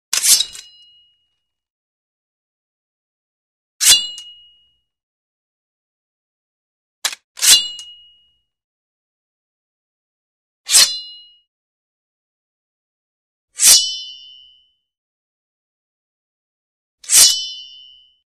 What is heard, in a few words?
Sword Draw From Sheath Medium Sword Drawn From Sheat; Hollywood Edge Foley Sound Library